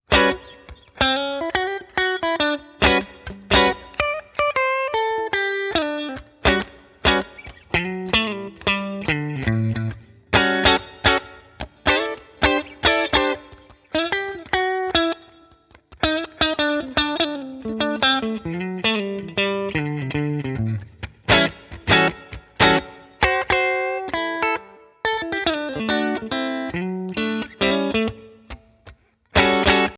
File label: Screaming Blonde clean DRIVE 9 h CHAR 9 h Tubescreamer off